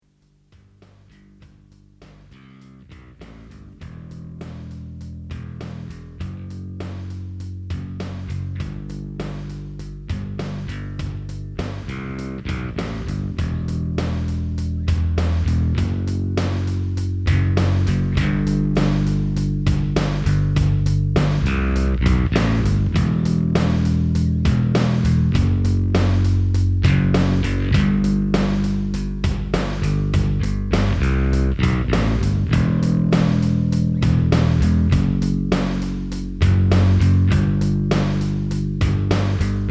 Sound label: instrumental theme for an unwritten horror film